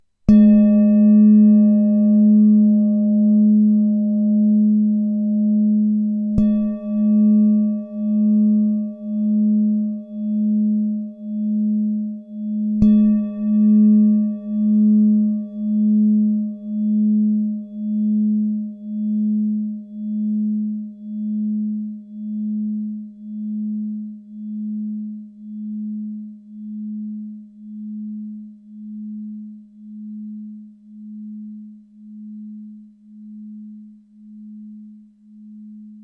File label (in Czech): tibetska misa; g 3; 19-5cm